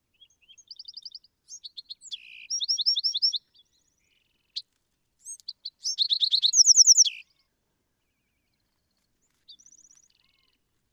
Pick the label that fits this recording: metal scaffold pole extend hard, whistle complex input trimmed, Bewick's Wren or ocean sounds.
Bewick's Wren